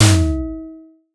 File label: impact cinematic thud